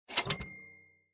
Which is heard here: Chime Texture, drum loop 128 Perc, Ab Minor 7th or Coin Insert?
Coin Insert